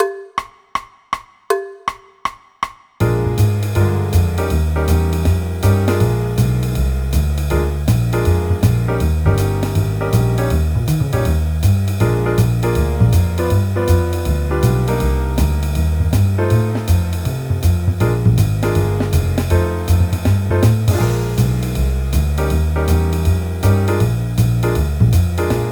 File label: Buzzy Backing Track